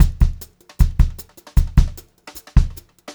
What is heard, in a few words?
Brushed Drum Loops; USB Soundscan vol.39; AKAI